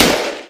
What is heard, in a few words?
[Drum] 총; 音MAD; 少女终末旅行; 소녀 종말 여행 음원팩